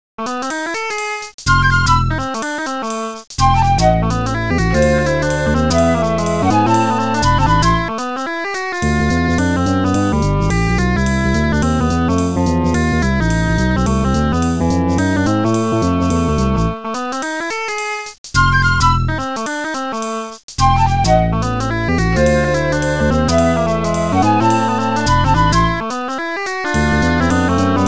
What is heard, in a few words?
Camel Jazz; Aladdin; dos; amiga